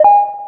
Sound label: coin; timber